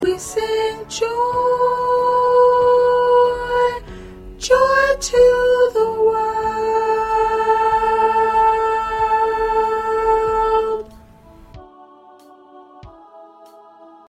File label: Soprano, choir